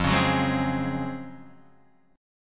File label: Db Major 11th F